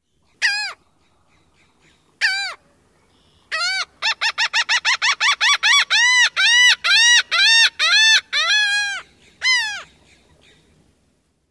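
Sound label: Laughing Gull laugh-like outburst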